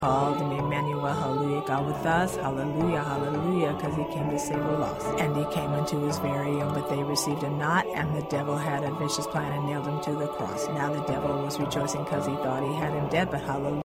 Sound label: Ensemble Voice 3